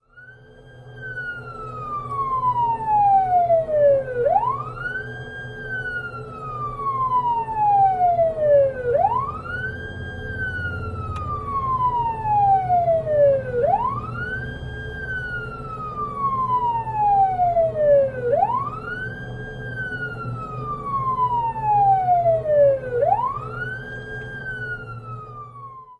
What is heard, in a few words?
Police Siren: Interior Perspective with Various Types.